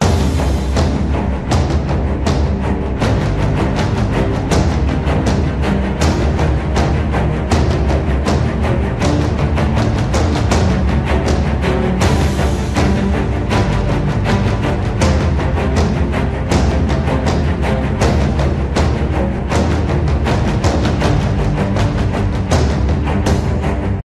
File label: Epic; orlamusic